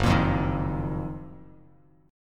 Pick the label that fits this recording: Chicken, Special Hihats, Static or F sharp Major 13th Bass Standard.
F sharp Major 13th Bass Standard